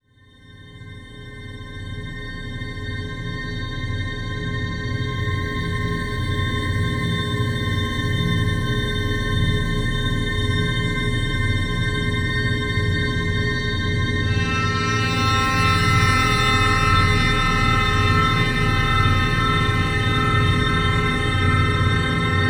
Alien Drone, pad; Sonic Foundry (Sony Creative Software); James Johnson Spektral Minimalism; Pad FX

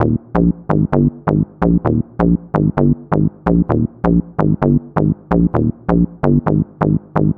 Bass Loop, 130bpm; Zero-G; Soundclash Nu Dance Electro; Construction Kits; Feet First

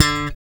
6 STR FUNK; Spectrasonics; Bass Legends

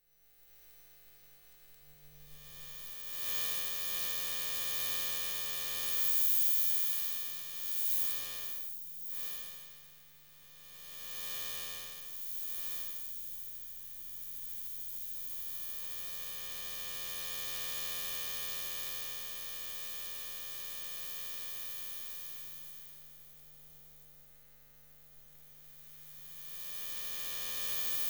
Electromagnetic Fields, Hummin; electric fields

